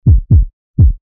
pulses heartbeat style

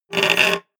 scratch

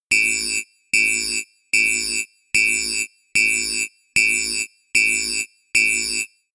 sci fi alarm red flash sequence

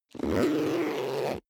zipper backpack close

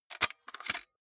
walkman open short

